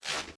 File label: reality breach spark; Stellaris; nemesis